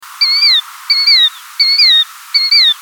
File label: Charadrius vociferus, Water Birds; Chordata